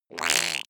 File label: creature quack short; kreaturen